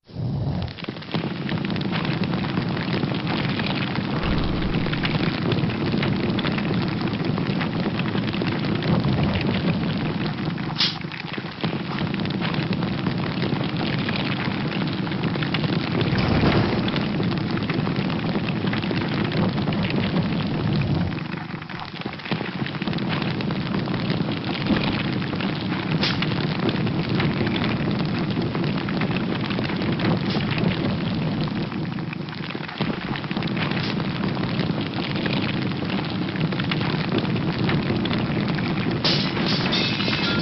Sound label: flame